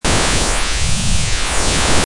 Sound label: Zing